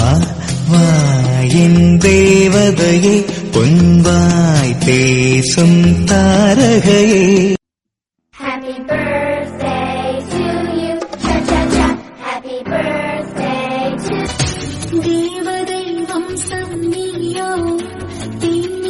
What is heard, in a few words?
Birthday Song Tamil; Happy Birthday